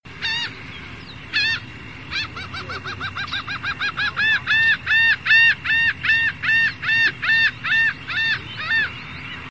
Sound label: Sound of Seagull